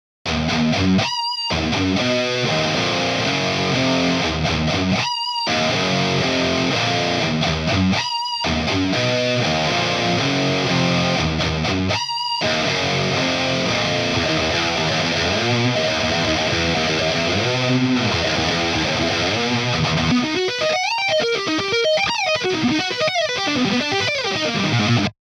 Lead HiGain Bright Stereo; Mercury2CP

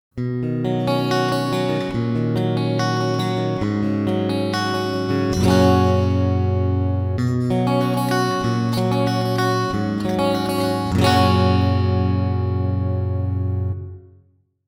Green Clean Rev; JupiterXTC

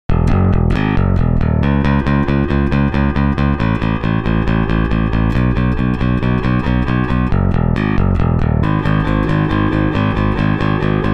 Bass DI; GeminiPTE

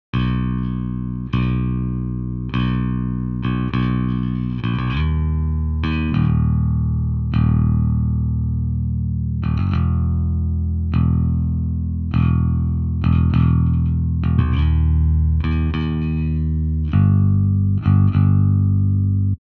Bass Original

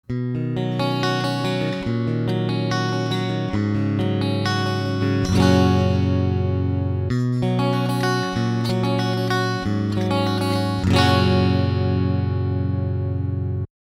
Rhythm Clean; Mercury2CP

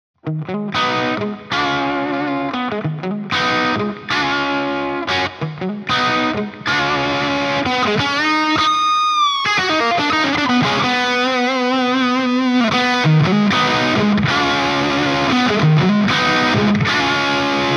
Blue Pushed Rev; JupiterXTC

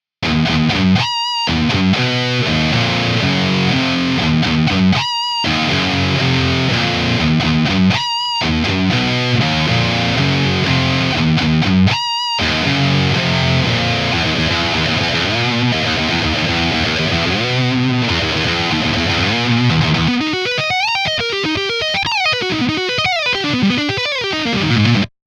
OVERDRIVE RHY Mono; SaturnLO

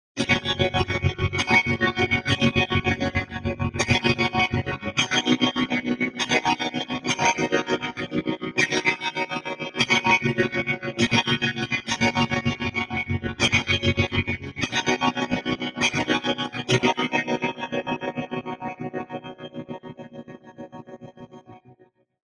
Tremolo Wet; Virgo

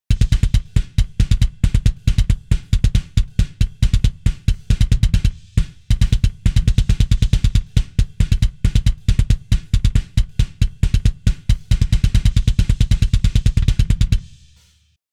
Kick Original

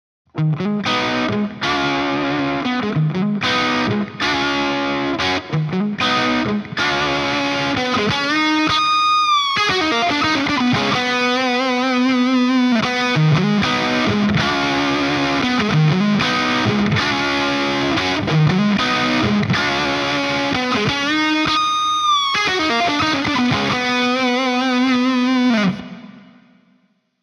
CRUNCH C5 Rev; SaturnLO

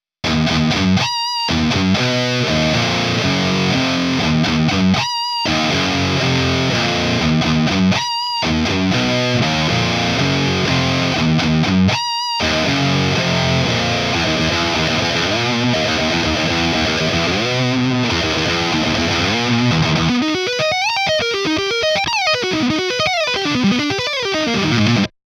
OVERDRIVE HIGAIN BRIGHT; SaturnLO

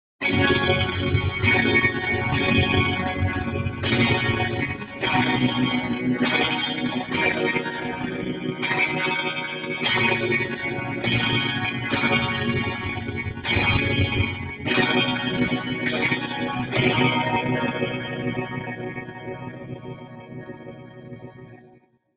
Tremolo Wet Stereo; Virgo

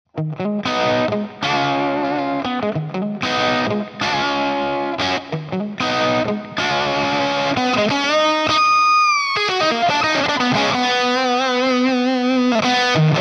Rhythm Pushed Rev; Mercury2CP

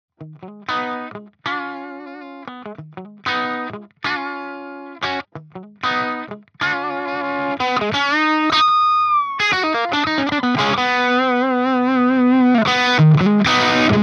Plexi Pushed; JupiterXTC

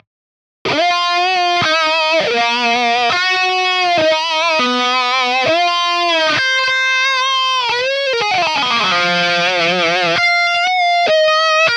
Lead Solo Wah; Mercury2CP

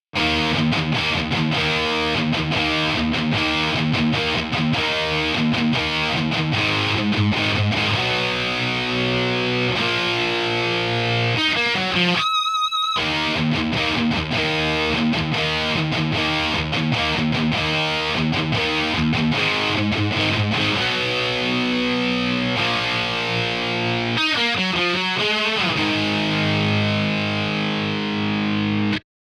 Blue Crunchy Stereo; JupiterXTC

